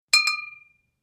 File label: Bottles Clinking